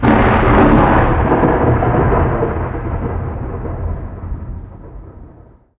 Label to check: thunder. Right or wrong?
Right